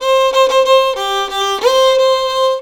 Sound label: VIOLON, Indian Traditions; USB Soundscan vol.45; AKAI